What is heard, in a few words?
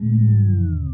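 Power Down; Real War Air Land Sea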